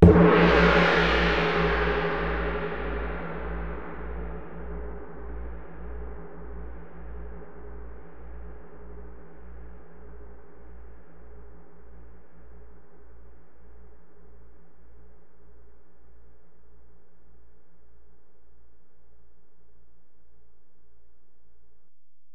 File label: Ride, Alesis DM-PRO, Drum Machines